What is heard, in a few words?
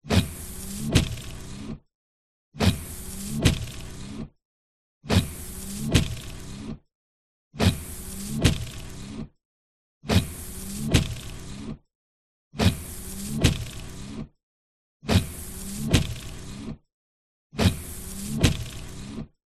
car windshield wiper wipes external; transport